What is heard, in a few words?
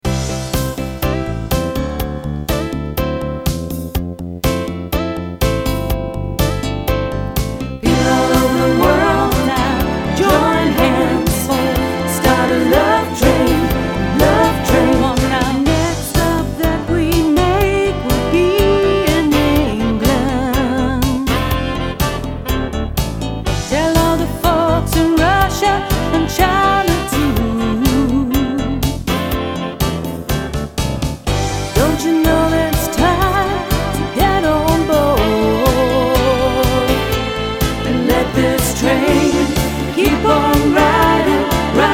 mix more fx; Disco Band playing a set list of hits from Motown, Soul & 70’s Disco